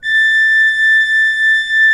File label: HGRP A5, Cathedral Organ, HOLZGEDACKT; Propeller Island